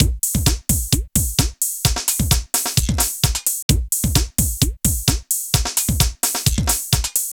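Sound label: drum loops, right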